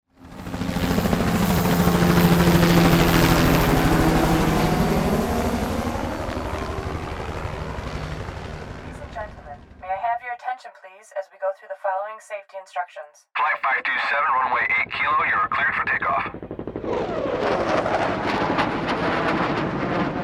blastwave sound fx; splice

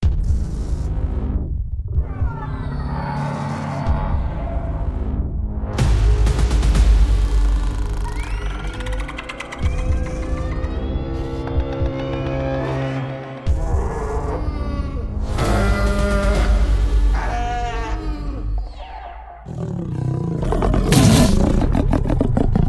aquatic predators sound fx; boom library

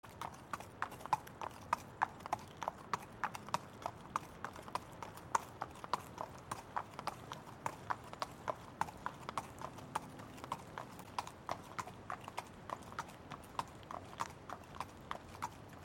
horses on the range sound fx

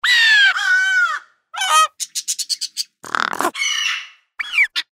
birds bible sound fx

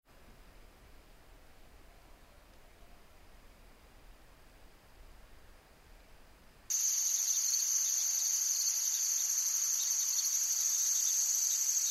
forest and woodlands sound fx

